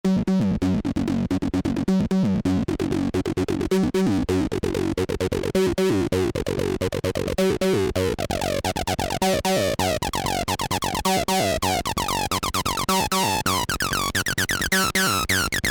x0xb0x Saw vs. Big Muff

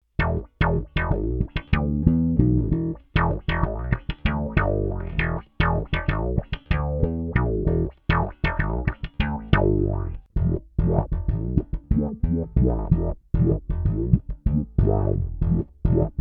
Slap Thumb

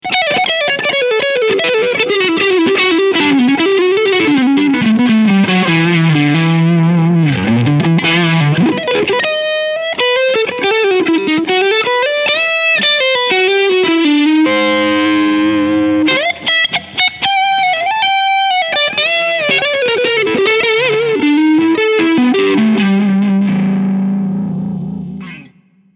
Medium Gain; IBZ

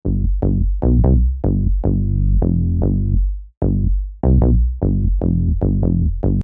Bass mix bass

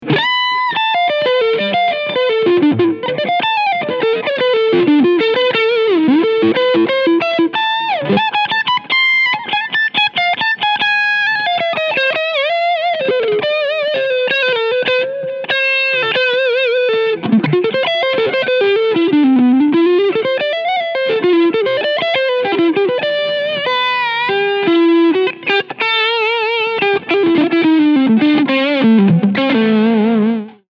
Overdrive Preamp Switch Down Full Gain; JHS Pedals

